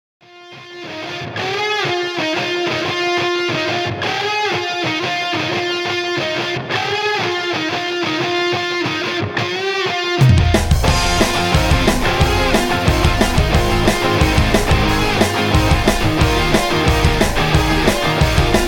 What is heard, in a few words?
180bpm remix